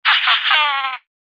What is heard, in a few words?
evil laughter real